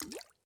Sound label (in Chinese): 滴水石锥水滴入炼药锅声